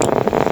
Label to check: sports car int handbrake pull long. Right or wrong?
Wrong